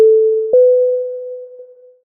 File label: Ping